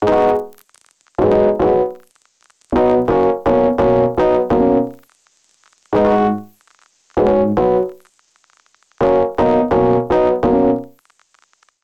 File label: Smokey Keys Bb Minor 81 bpm; MIDI Clips; Tonal; Accompaniment; Ableton; Factory Packs; Chop and Swing